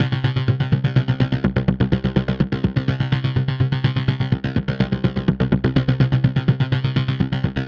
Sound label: synth 125 riff C; Transmission-X